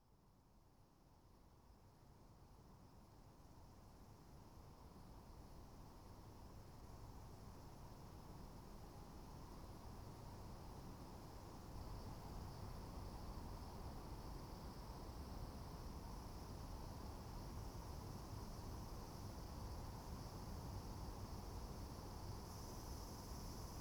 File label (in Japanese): 梨狩りの音、梨の皮むき; 二色の梨狩; 梨のウィスキー漬けづくり